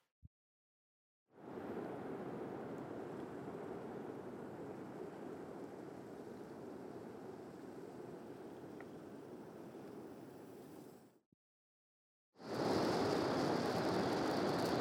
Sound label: quiet med high; gritan en un intento de hacerse oir entre el ruido de embarcaciones